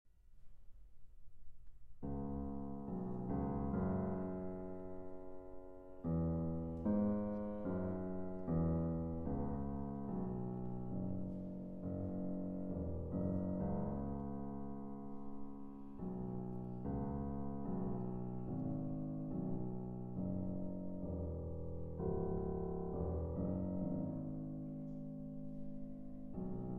Piano Trio in A Minor, Passacaille. Très Large

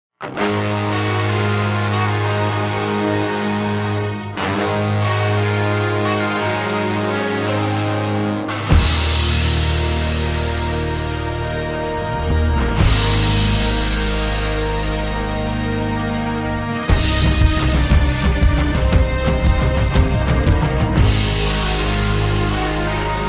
Instrumental

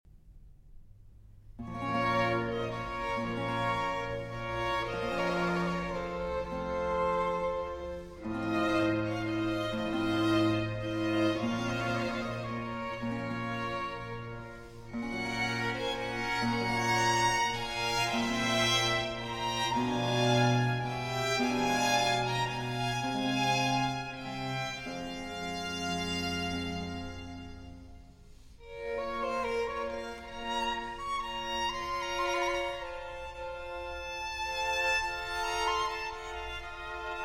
Violin Concerto in G Major; 12 Violin Concertos, Op. 4 - La Stravaganza - Concerto No. 3; RV 301

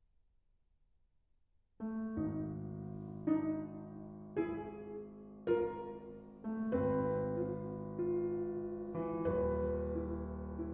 Piano Suite, Thema con variazioni. Lento

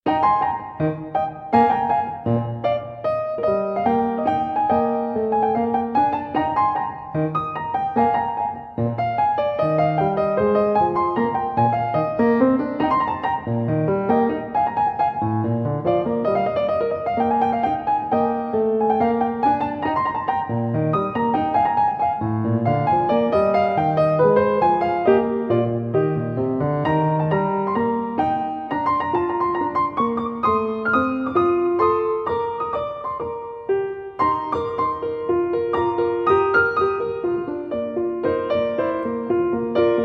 Piano Sonata in E-Flat Major, Rondo; Movement 3.

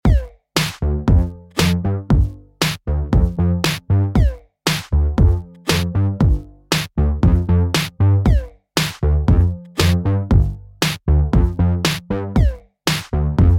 Instrumental

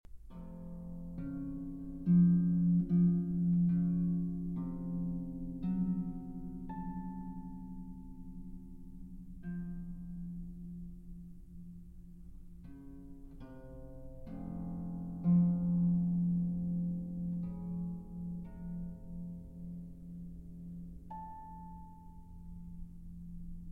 Sonata for Harp, Op. 127 II. Lento Misterioso; Hovhaness

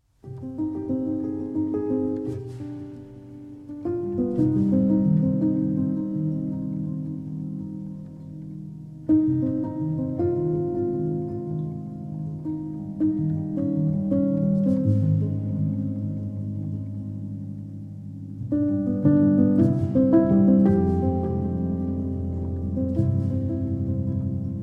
Prelude in C Minor for Piano; Op. 28, No. 20

